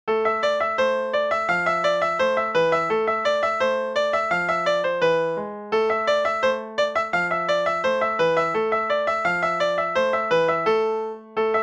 piano

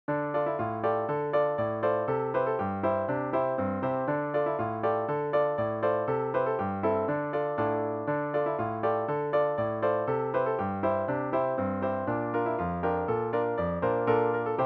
polka simple

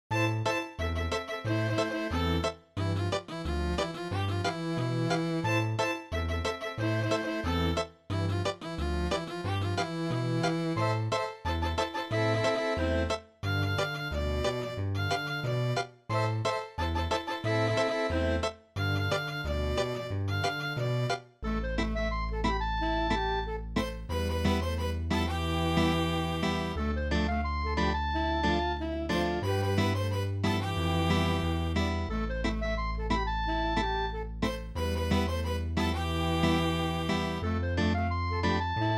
scottish reggae

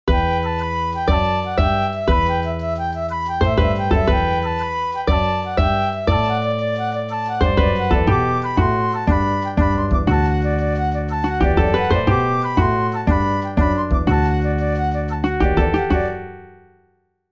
irish jig

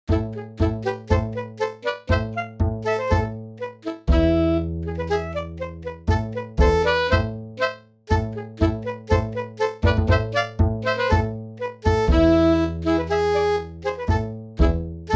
tango suedois

